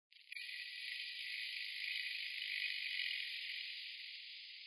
Bear filtered

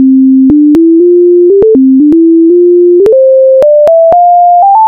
Hail Purdue Remix

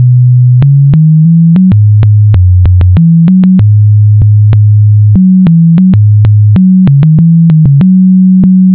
Fight Song Part B

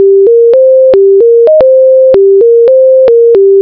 (faster)

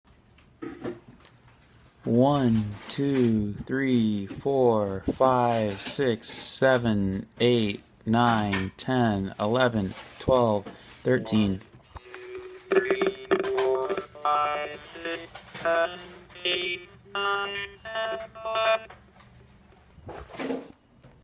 Octave autotune

